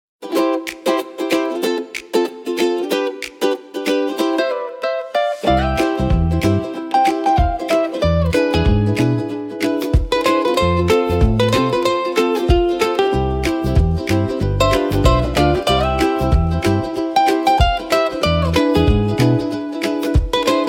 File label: ukulele tropical for bright and light